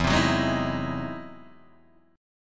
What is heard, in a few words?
E Augmented Major 9th, Guitar, Open D